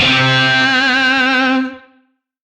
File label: Shawzin Oct Two C; Music; DE; Warframe; Lizzie Shawzin